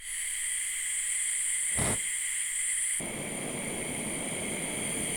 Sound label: Lighting blowtorch